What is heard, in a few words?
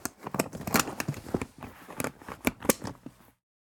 bag handbag clasp; sonniss gdc